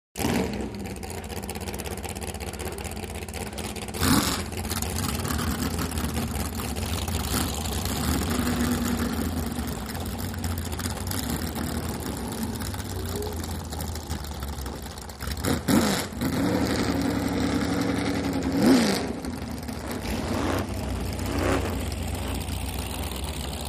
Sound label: Drag Boat Start idle